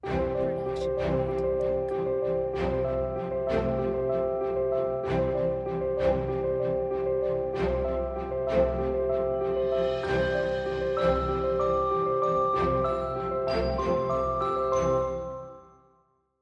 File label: Corporate Ident Sweet Summer; SoundsCrate